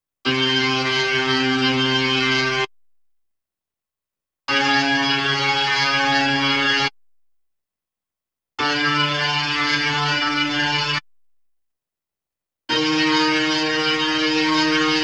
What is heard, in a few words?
B3 Organ; Masterbits CLIMAX 8